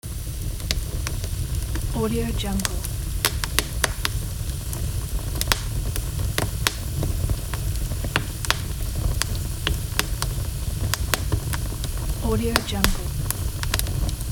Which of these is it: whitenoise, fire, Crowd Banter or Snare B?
fire